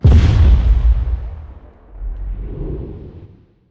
super explosion; Stellaris

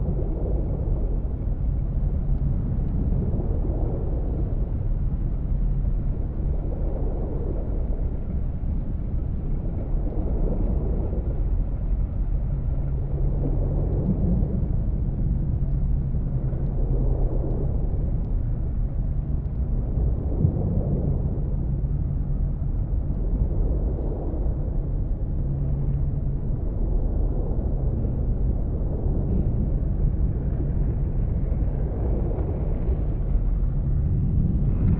cruiser idle; aquatics

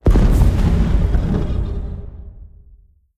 star expand; stellarites; Stellaris; guardians

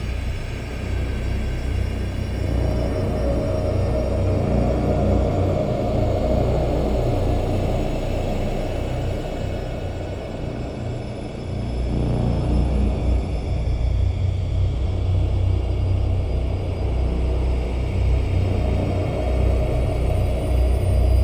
ship colossus idle; synthqueen; Stellaris; machineage

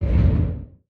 distance flak weapon fire; weapons; Stellaris

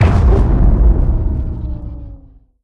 disruptor fire, weapons; Stellaris

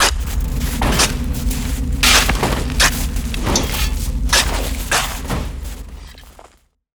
archeology event dig site; ancient relics

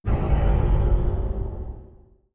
distance railgun fire, weapons; Stellaris

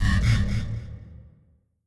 set war goal; Stellaris

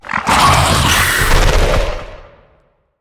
archeology event dangerous animals; ancient relics

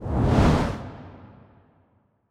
tail swish high; biogenesis

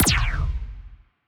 anti fire rate laser muzzle, weapons; biogenesis

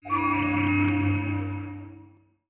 shielded planet; ambient; Stellaris

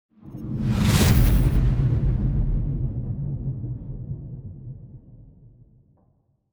destroyer move; aquatics

